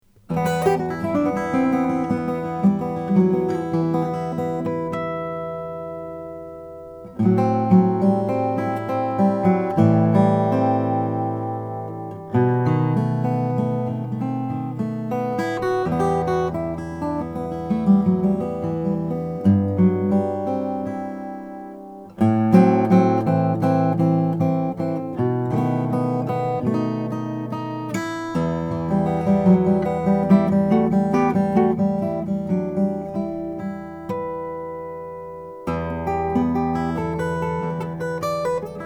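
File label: alberico om cutaway nicaraguan cocobolo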